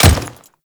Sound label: Default Step, Footsteps